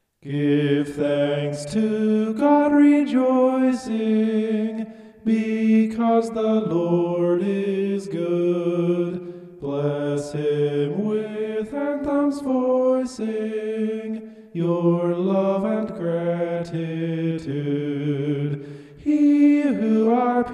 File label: vocal homoph; psalm 107